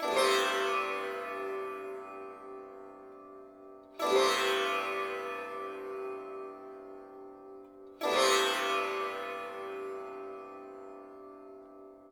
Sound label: sitar